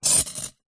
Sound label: nature ice block sharp knife scrape